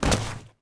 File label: bodyfall, foley